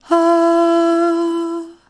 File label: Aahooh E, Female Breathy Vox; VOCAL